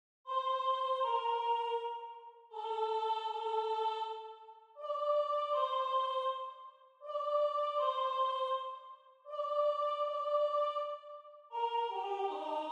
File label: soprano